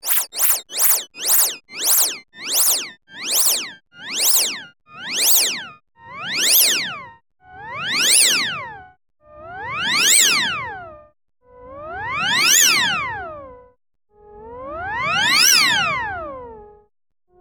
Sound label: Zither